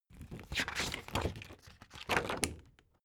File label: spring binder open long